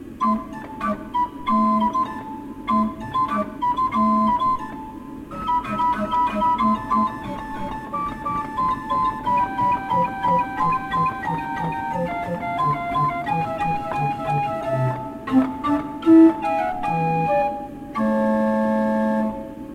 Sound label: pipe organs; Offner